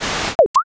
gacha roll

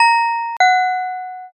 pinpon bell

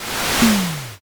fire magic

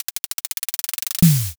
drumroll

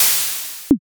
airlock

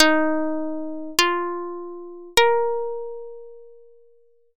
koto

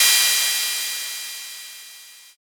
cymbal

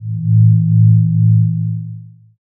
space drone

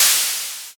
open hat

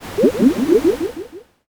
poison

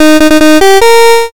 fanfare

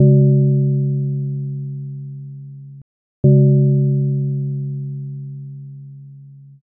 gong clock

